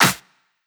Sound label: House Clap